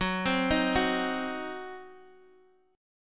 Eb Minor 6th Gb, guitar chord

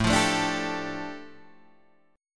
A 7th Suspended 4th Sharp 5th A, Guitar Open D; chord

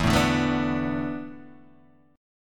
E 7th Suspended 4th, Guitar, DADGAD

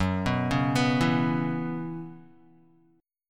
Gb 7th Suspended 4th Gb down Guitar, chord; Gb7sus4; arpeggiated; JGuitar

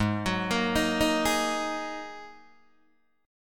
Ab 7th Suspended 2nd down Guitar DADGAD

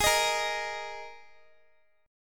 Ab Suspended 2nd Guitar; strummed; Absus2

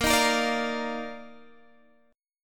Eb Major 7th Suspended 2nd Bb

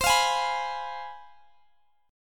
C Major 7th Suspended 4th Sharp 5th, guitar

